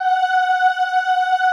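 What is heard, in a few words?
OOH F#4 left, classical choir; Best Service; Extended Classical Choir; OOH MIX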